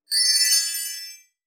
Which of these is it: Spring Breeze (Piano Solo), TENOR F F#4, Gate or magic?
magic